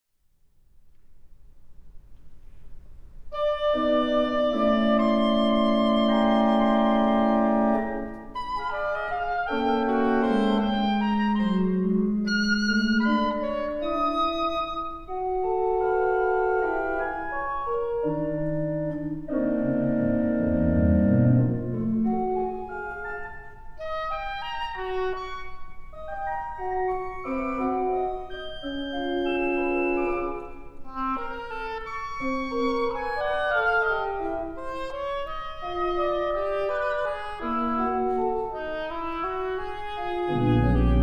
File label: Variations for Oboe and Organ Dithyramb; Daniel Pinkham